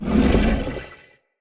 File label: Corrupted Battle Dog Attack Bark